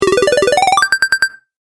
Win Bonus Points, video game sound effects

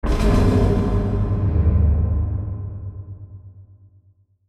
Hit Metallic Delay Drum; Blastwave

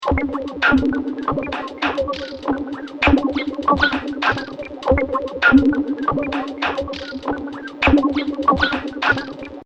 100 Bpm Liquid Drum Loop; Drum Loops; Percussive And Rhythmic; Seamless loop; Andrew Fleming

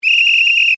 Whistle Blow, Long; Sports; Plastic; BlastWave

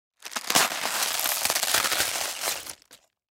Cabbage Open Tear Stress; Food Cabbage Open Tear Stress Long Vegetable; Household; Foley; Blastwave

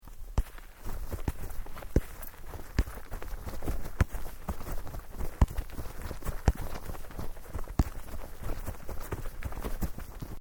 Sport Soccer Dribble Grass, Soccer ball sounds

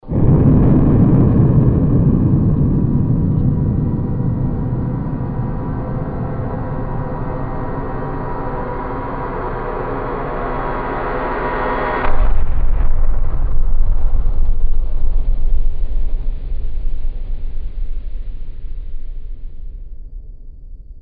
First Strike Stinger; sound design; Stinger Sound Effects

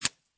Suppressed Pistol Amphibian Barrel; Pistols; Guns; VRSonic

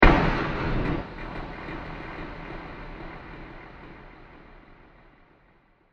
Heavy Mangled Drum Hit; Bursts; Impacts; Crafted Signals

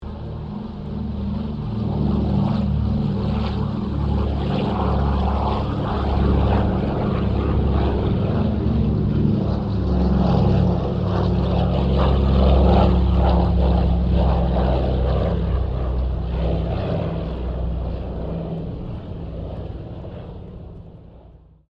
AIRCRAFT DC3 SQUADRON 2 TAKEOFF; Airplanes; Sound Effects:Vehicles

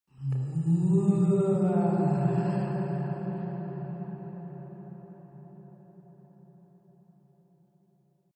Ghostly Moan; Ghost Sounds; Horror

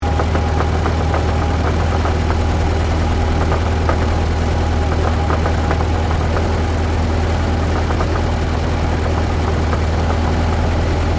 Truck Chevrolet 1926 30cwt Drive; Classic comercial vehicles; Trucks

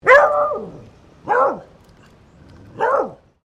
ANIMAL DOG BEAGLE FEMALE BARK

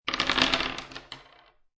Dice Throw Five Dice On Wood Table; Casinos

